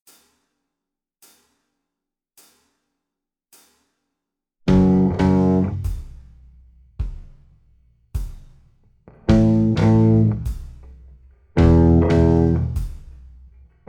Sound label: riff slower, guitar